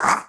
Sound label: damage, skeleton soldier; monster; skeleton soldier scythe